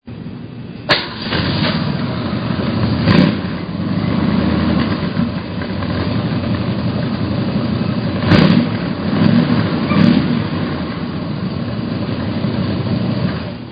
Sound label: roar; Della